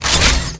Pickups

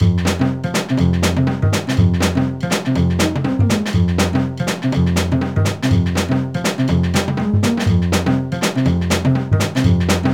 BGM Creche Music; Baby Life; nds

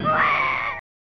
Baby Crying

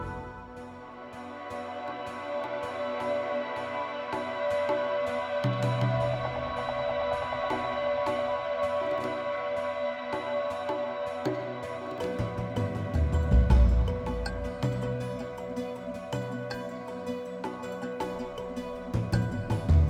Ginso Tree Post Mortar Loop; Ori and the Blind Forest

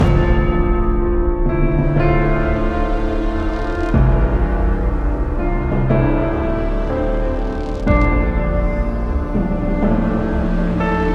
BGM Loop

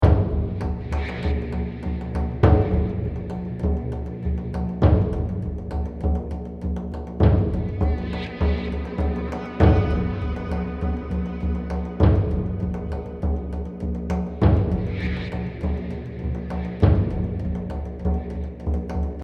Battle Loop; Infinity Blade 2

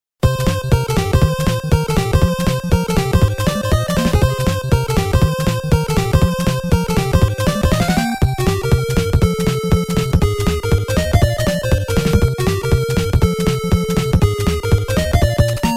Power-Up BGM; Konami Shooting Collection

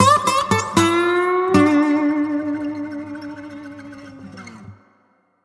complete sting

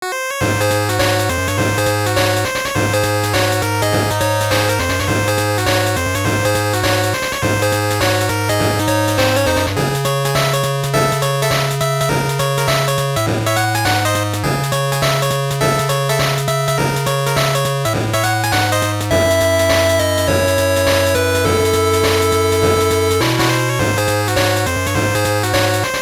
Swanky Swing; gb

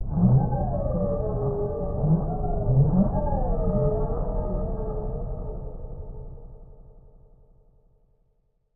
Mysterious Moaning; Secret of Mana; snes

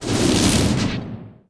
Dark; New The Denpa Men

